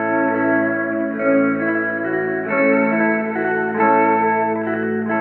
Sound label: Psilosonic Guitar Slapped; Sonic Foundry (Sony Creative Software); Ma Ja Le Ethereal Textures